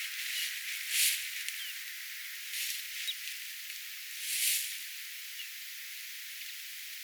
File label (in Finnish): hemppo päästää djip-äänen